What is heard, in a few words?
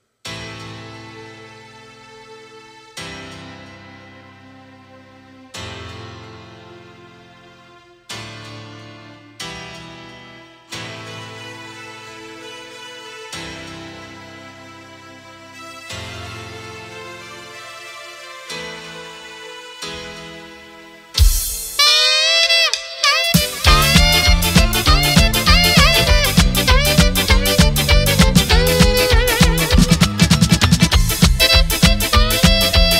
sax; roland